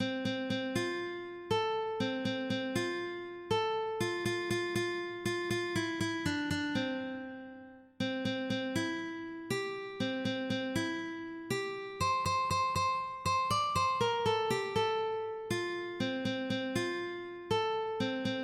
cucaracha, ukulele

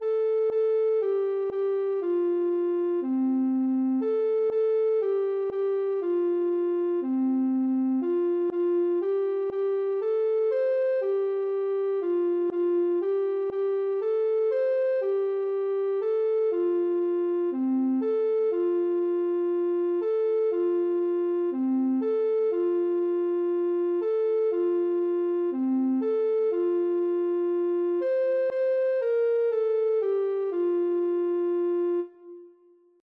ocarina

